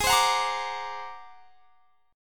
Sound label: G sharp 9th Flat 5th G sharp; G#9b5 strummed; x,23,22,23,23,22; JGuitar